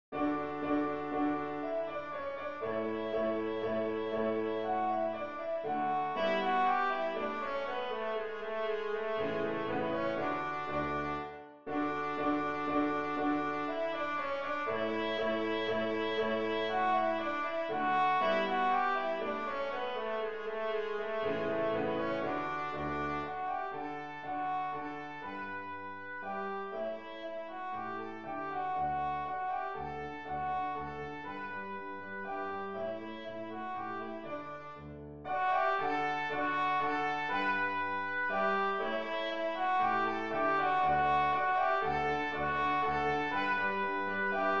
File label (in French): danse allemande, ré majeur; haydn joseph